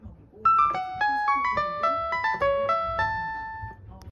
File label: Original Nokia Ringtone; ringtones